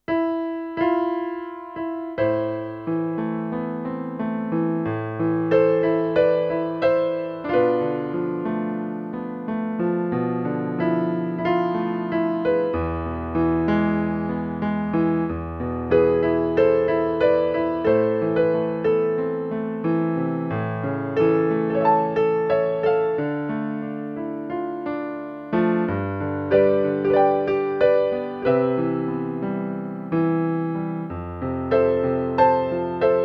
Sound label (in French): piano japonais; albator